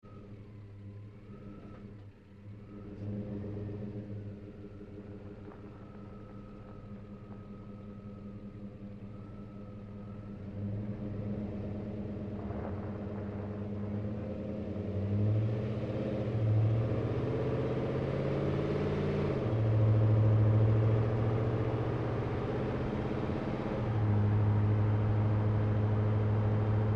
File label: Truck GMC Savana Onboard Drive Accel-Decel Med Fast; Trucks; Big Room Sound